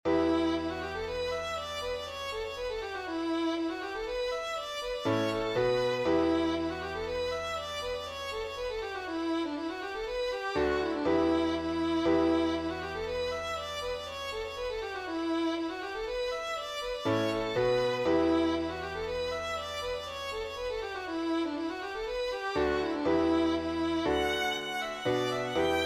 polska

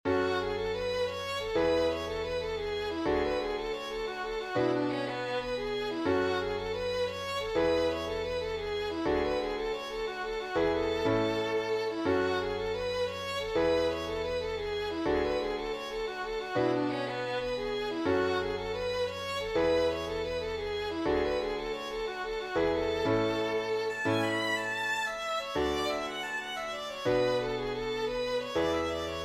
årepolska

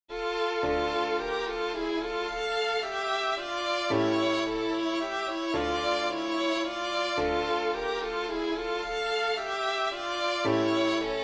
valsen

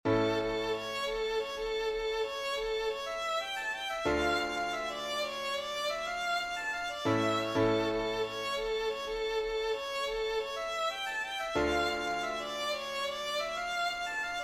polska

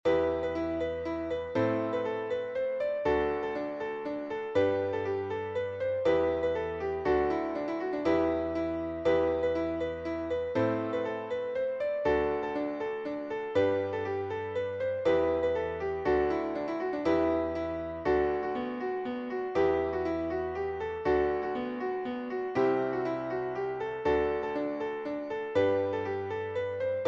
slängpolska

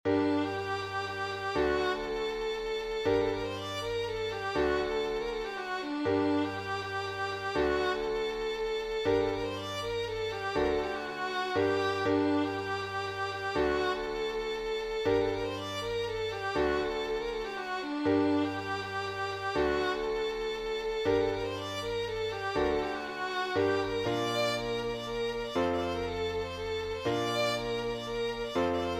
polska från tenala

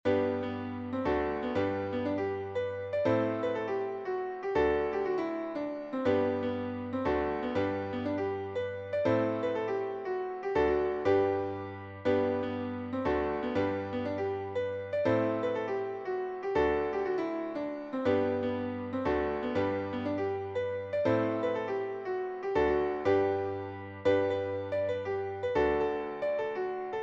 esshambo

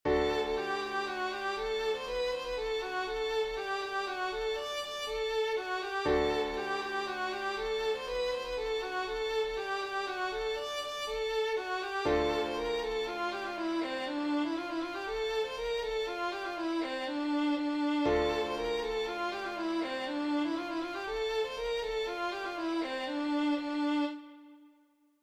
polska

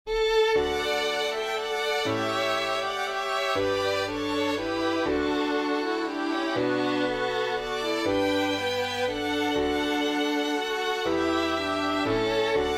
vals

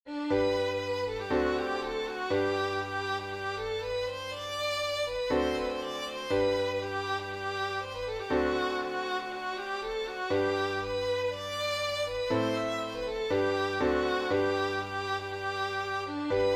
brudmarsch

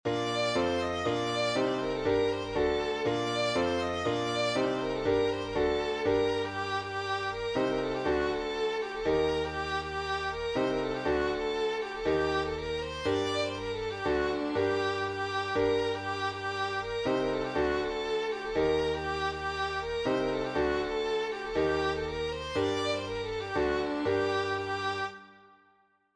polska

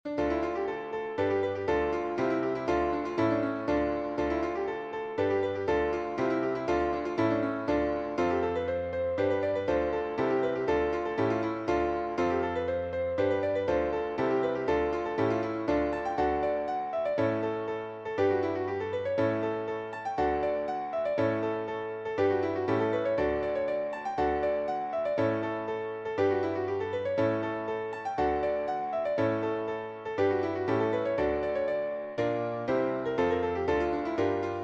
norsk schottis

